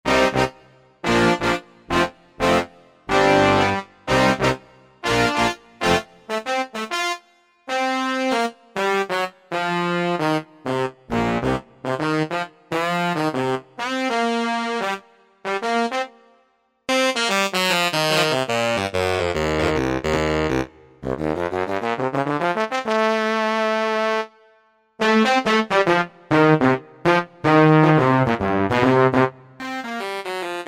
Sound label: The Big Brass, Korg Wavestation A-D